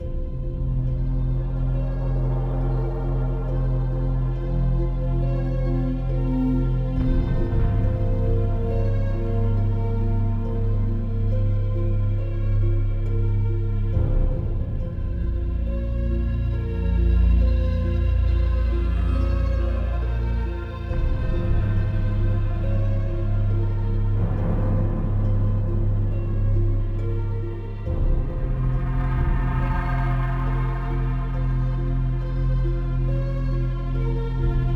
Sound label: Menu Quiet Light; Tom Clancys Ghost Recon Advanced Warfighter; XBOX